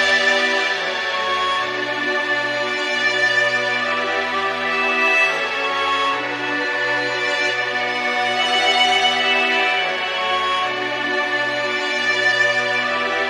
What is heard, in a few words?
strings, Sad